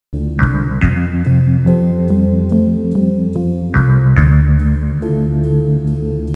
theme SMS; twin peaks

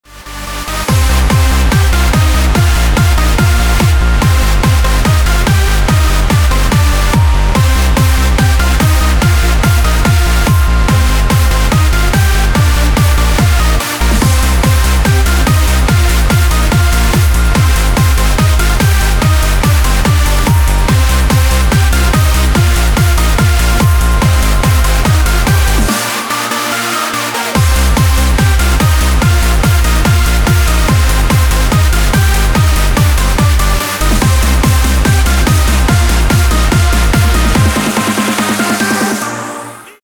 radio mix

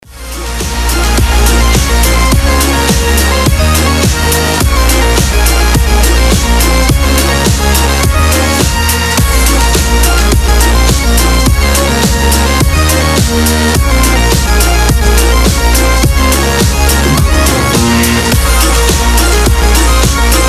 folk country rounds remix

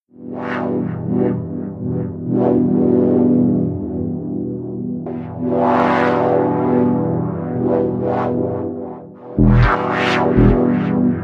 remix

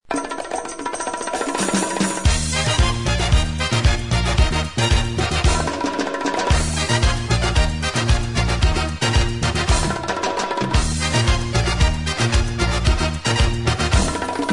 ispanskaya melodiya spanish melody

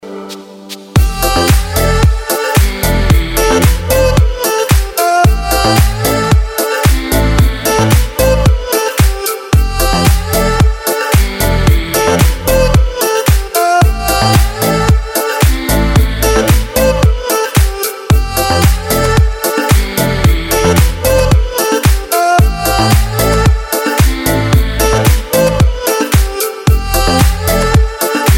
erhu

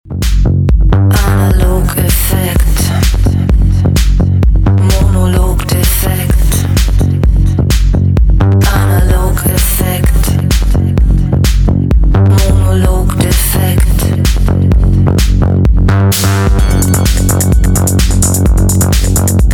analog effekt

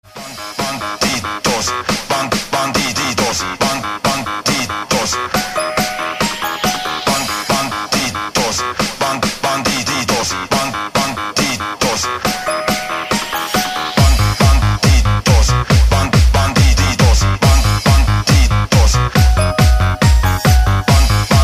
melodia original mix

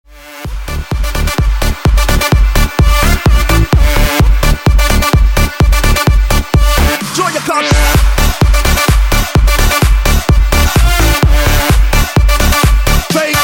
radio bounce

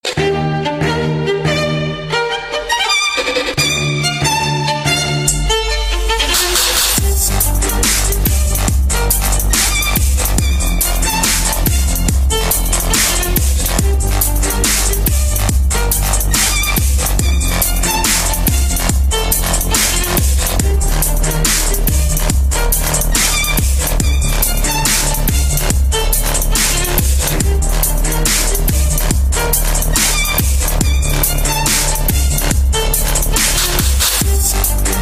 dub step klassika

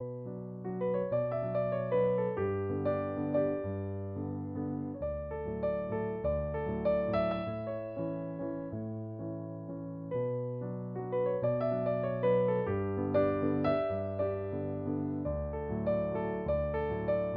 piano cover

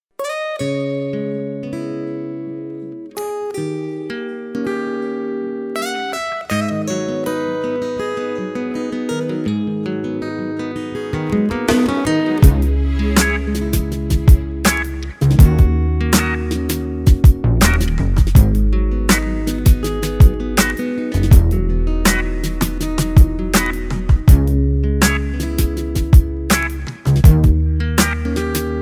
instrumental

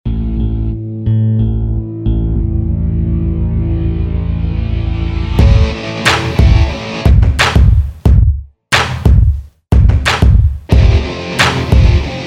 rock rap

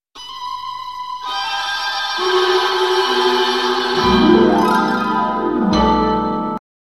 SMS; brilliantovaya ruka

